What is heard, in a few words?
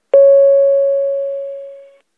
door